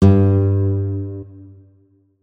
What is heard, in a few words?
notes, guitar; Hideout Furniture